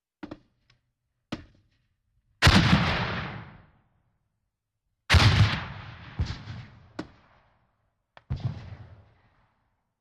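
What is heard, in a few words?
Grenade launcher shots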